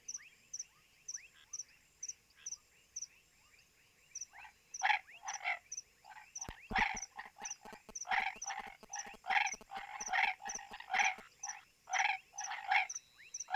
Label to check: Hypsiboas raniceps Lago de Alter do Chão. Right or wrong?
Right